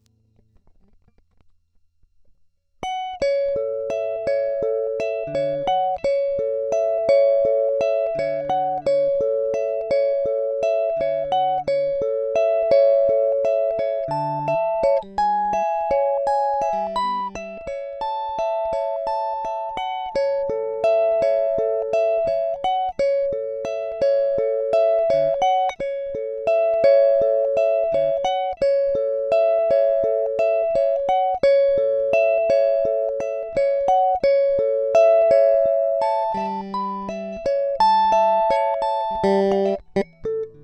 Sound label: New Wave Chorus